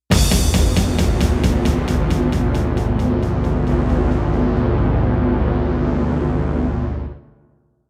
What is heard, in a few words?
Roland SC-8850; Doom Title Screen Theme; Doom Revisited